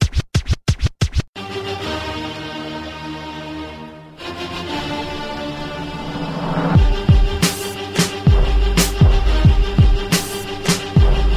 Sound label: classic hip hop